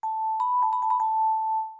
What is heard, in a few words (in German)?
Klingel Triller